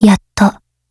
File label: m4a1 start